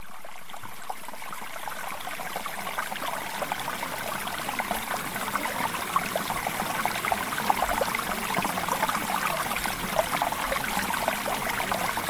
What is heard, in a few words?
Mountain Stream; Premium Subliminals; Desired Face